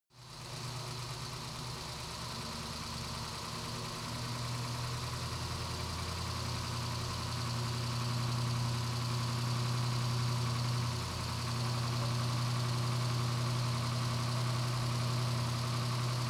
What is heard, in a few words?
car camaro idle faster engine